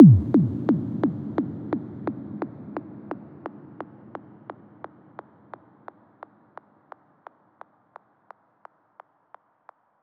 FX Impact

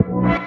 B STAB, funky; Loopmasters; Funky Underground Vol 2

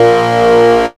HARD Bb2; dubstep; Jack Sparrow; Deep Dubstep Progressions

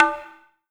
PERC; Loopmasters; The New Sound Of Dubstep; DKD DRUM HITS

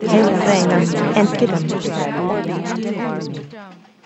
Crowd Around; Fx; Loopmasters; Jon Carter & Alex Blanco; Block Party Essentials

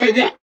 B VOX STAB; funky; Loopmasters; Funky Underground Vol 2

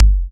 Bassdrum; Mutekki Media; Vengeance Electroshock Vol.2

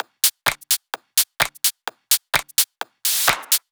Loop, 128 BPM

